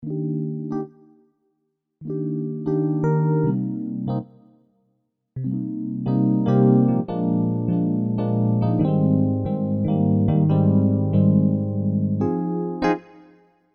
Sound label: dx E.Piano Wet; fantom-x8